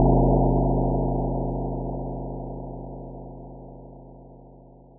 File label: Gong